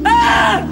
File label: scream